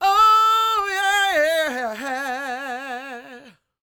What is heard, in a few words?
SING, Vocal Planet Blues & Soul; CW SOUL